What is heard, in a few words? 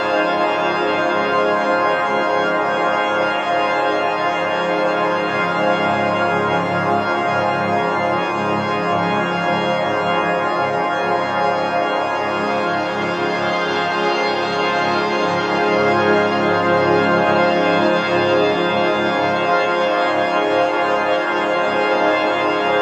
ambient Organ; Balatro